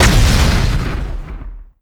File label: laser